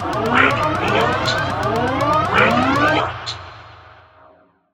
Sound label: red alert; Volasaurus